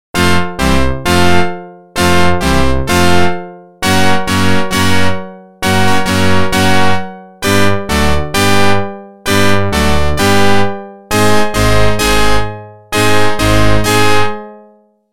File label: Organ; NHL 94